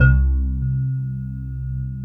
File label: CRYSTALS